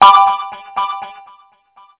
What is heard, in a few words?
School Class Change